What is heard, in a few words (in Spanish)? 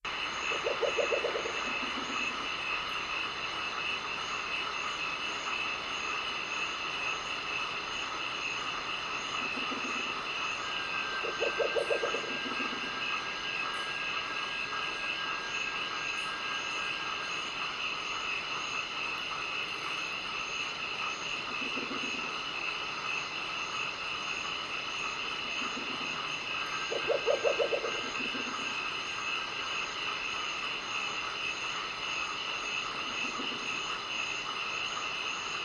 Lechuzon Mocho